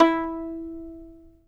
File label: fiddle pizz E4 R; Sampleheads; Whole Lotta Country 1 & 2